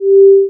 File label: prospector miss; technic; dreambuilder modpack